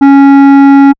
Chalumeau; Emergence of self sustained oscillations in single reed woodwind instrument

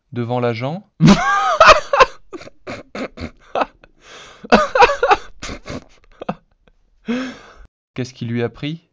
rire synthese basique

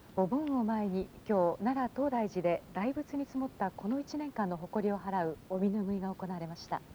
melodie

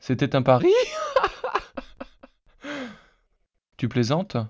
rire synthese contextuelle

